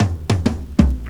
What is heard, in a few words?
DRMROLL; E-Lab; X Static Goldmine 2